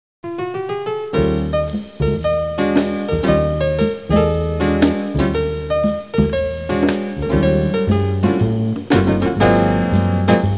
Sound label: blues